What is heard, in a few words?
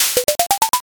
board flip